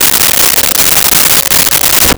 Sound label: Power Window Up; Automobiles